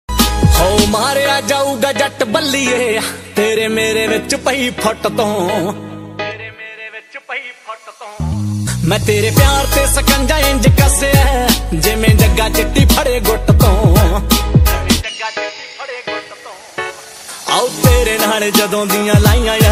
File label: Punjabi Ringtone